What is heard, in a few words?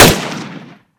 g3 unsil; garrysmod